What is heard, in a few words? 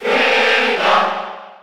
Cheer Spanish; Zelda; SSB4